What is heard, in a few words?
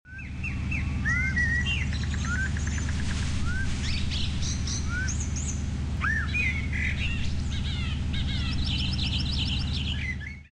Background Sound Of Day; Dude Theft War